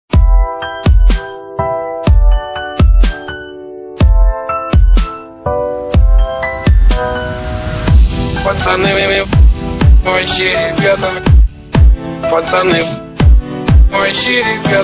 dubstep

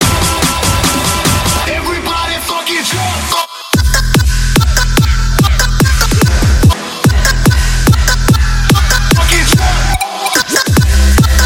trap; shikarnaya muzyka v tachku

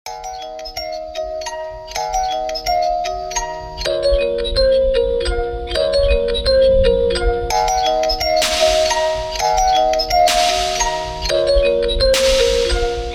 chill trap bells

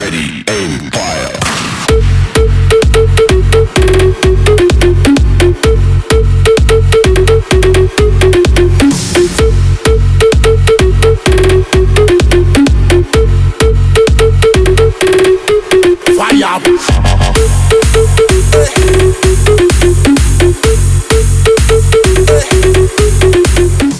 vocal mix

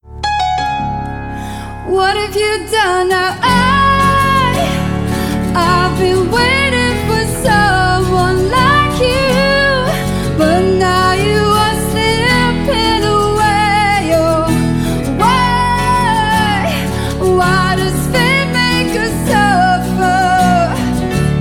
acoustic

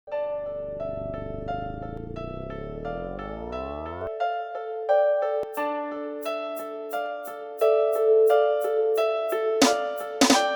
beat

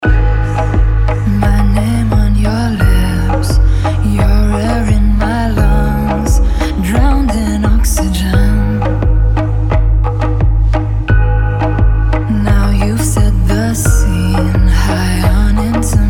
indie pop

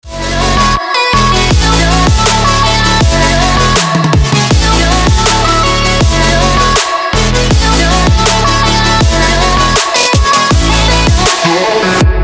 future bass

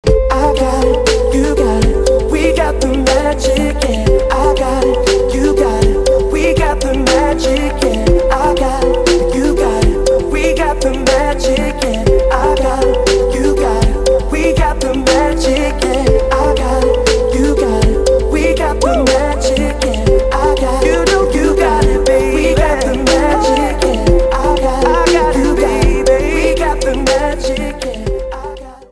chillout remix

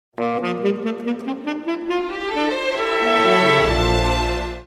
sms s intrigoy veselaya sms

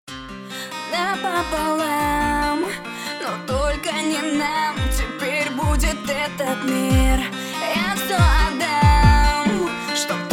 dubstep remix

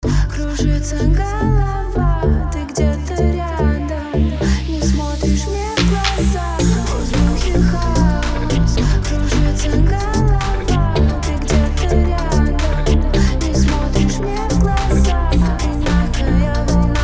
deep house

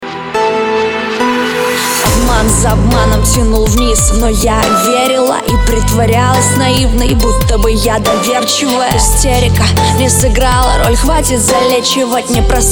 dubstep remix

